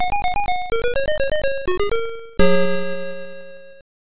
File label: Break Time ~ Return Home Music; Pac-Land; ZX Spectrum 128